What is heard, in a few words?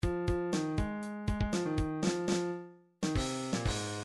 drums break, midi